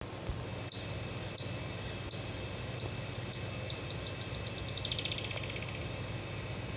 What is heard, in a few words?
Myotis feeding buzz; UWEXLakes